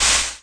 water spurt; Tony Hawk's Project 8; XBOX

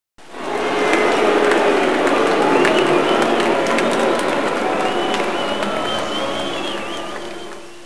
boo, crowd

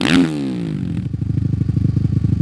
rev, Engine

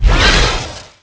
Ship Laser Shield

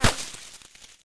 snow hit; projectiles; bullethit; Max Payne

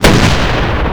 Smoke Grenade Blast; PowerUps

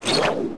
wire swing; Tony Hawk's Project 8; XBOX

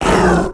hit; trando; Star Wars Obi Wan; XBOX

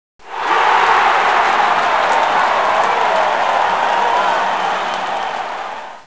crowd

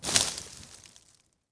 bush snap; Star Wars Obi Wan; XBOX

